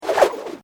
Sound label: Warfare Grapple Gun Rope Spool Slow; Miscellaneous Combat